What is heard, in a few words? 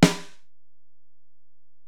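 Acoustic Snare, Alesis DM-PRO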